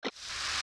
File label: Steps Push Crawl Deep Water; Zelda; OOT